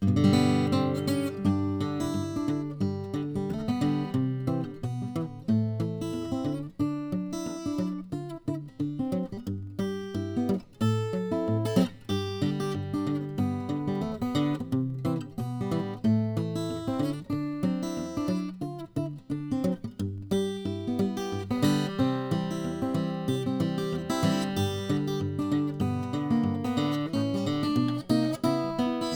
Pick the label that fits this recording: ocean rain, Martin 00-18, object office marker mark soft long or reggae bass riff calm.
Martin 00-18